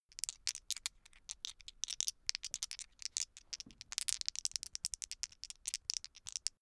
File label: dice shake hands open long